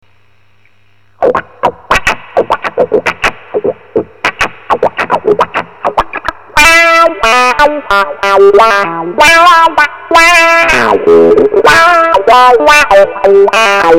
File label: fuzz face + wha sample